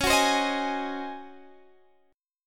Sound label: Db Major 7th Suspended 2nd Suspended 4th Db down Guitar Open D